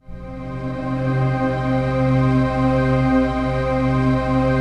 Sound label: TRANCPAD; Best Service; XX Large Pads; Sample CDs